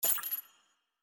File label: Bet Minus; goldenClover